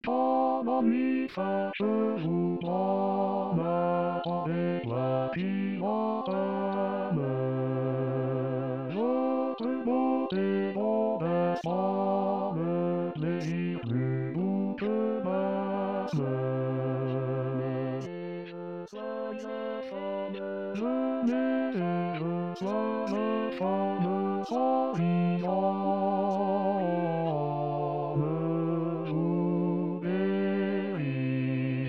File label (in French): basse; rimailleurs